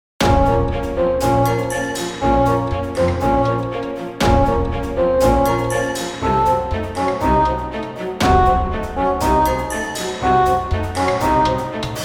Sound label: piano sonata